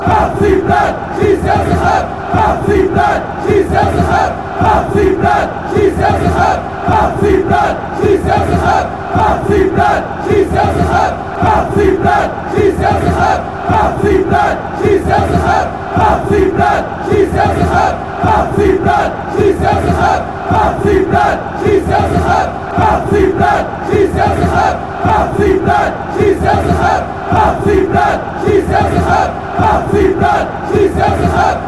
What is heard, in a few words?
chants; squash